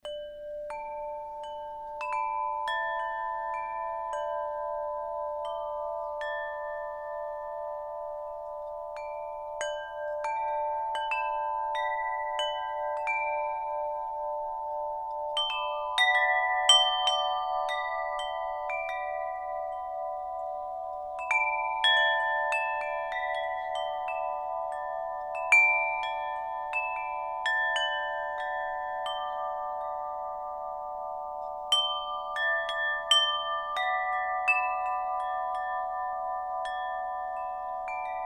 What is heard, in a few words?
windchimes